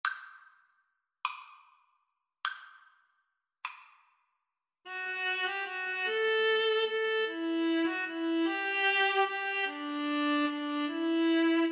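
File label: sonata violas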